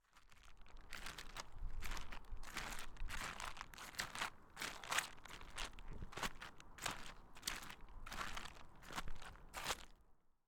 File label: Walking On Sail